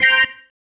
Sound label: Stab One Shots, Organ